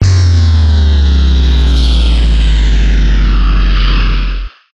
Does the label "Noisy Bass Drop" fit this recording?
Yes